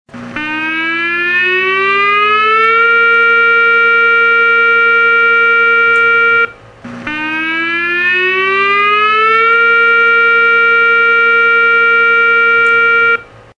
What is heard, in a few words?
alert Tone